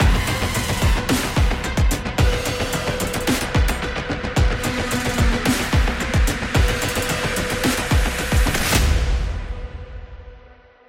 Danger, Aggressive background music for action videos, games, and cinematic trailers